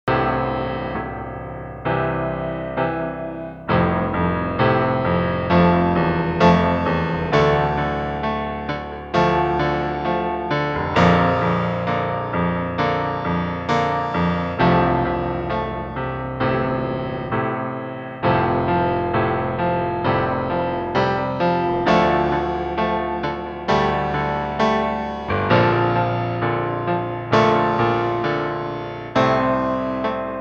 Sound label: Proteus 1 Low Grand